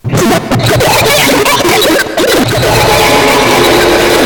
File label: cough